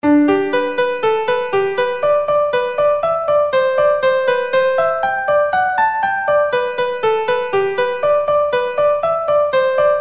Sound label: Tom McElvogue Jig In G